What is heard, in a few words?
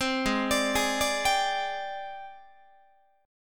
Ab Major 7th Flat 5th Ab, down, Guitar; AbM7b5 arpeggiated